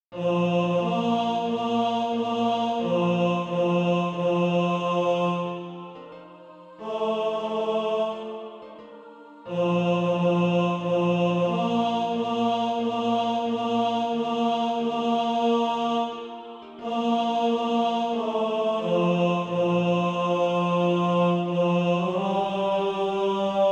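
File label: tenor, SATB Practise Music; Christ Is Born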